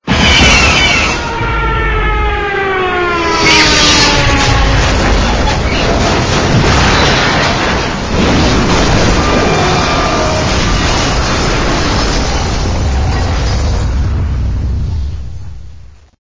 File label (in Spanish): nave inicio; Source Engine; deathrun starwars